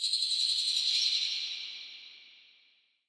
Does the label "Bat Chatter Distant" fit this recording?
Yes